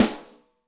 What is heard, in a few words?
Ambi Rim, Yamaha DTXpress-II snare